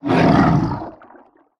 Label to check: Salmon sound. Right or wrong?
Right